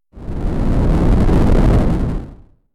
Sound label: earthquake